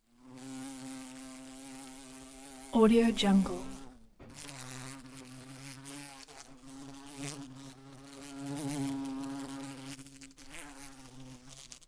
bumble bee flight wings close

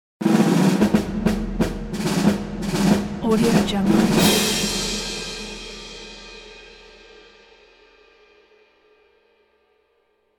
fanfare; winds productions

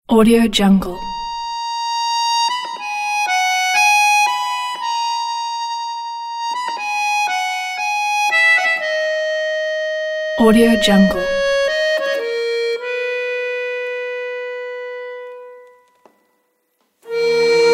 accordion and strings

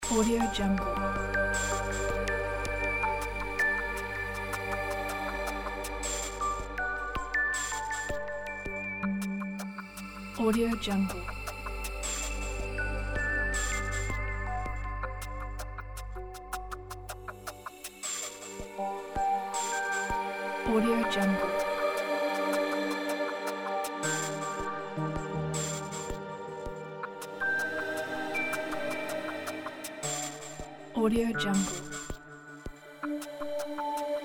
energy pulse

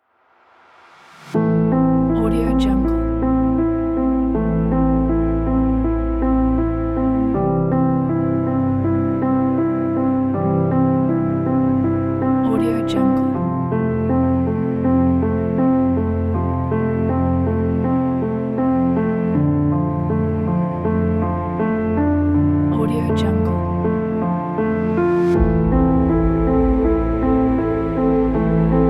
emotional piano

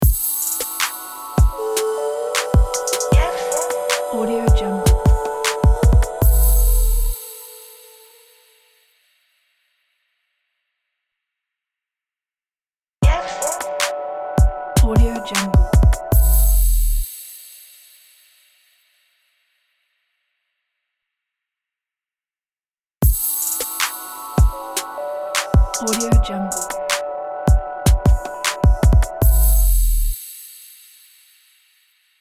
hip hop logo

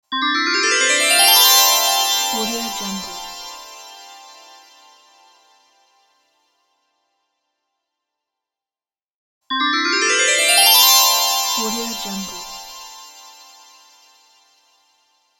magical

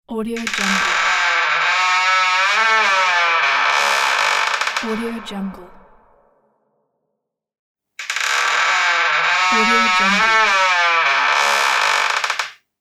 horror door creak sound

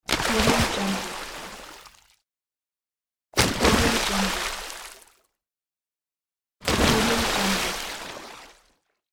water splashes

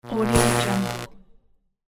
electric glitch

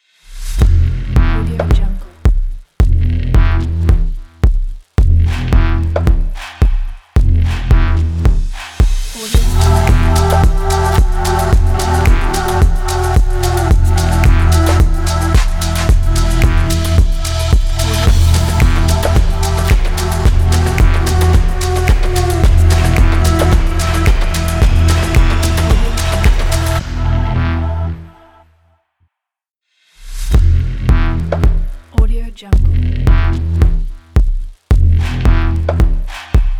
abstract fashion electro logo ident

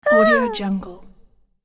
cartoon character death

